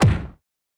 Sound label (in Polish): srednie uderzenie